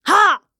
Flyer Attack; Col Flyer Attack

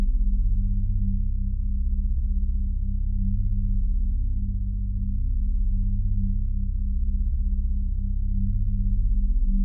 ambient; Soldier of Fortune Payback; PS3, Windows, Xbox 360; ukg

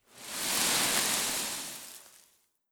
Burying

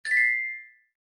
Painting unlocker

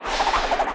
Fall From Top

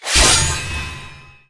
ui battle set formation

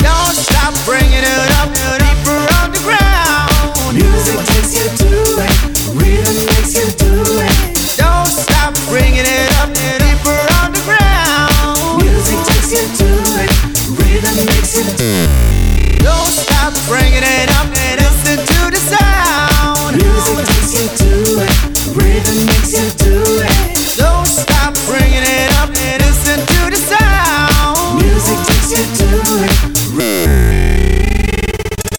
Loop; EndScreen

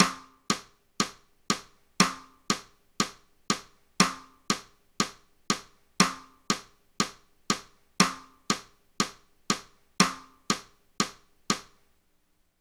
Menu Timing Adjust; dankira boys be dancing

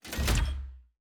Plasma Shot

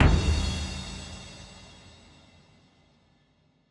Loop End C

